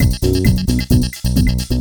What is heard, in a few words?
loop